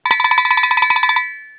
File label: cowbell